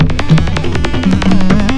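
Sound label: PERC LOOP, percussion kit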